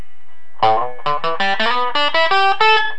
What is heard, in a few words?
Boost; WMouse